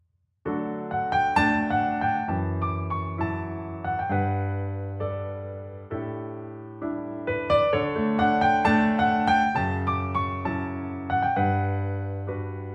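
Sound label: D piano, male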